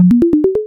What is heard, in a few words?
alarm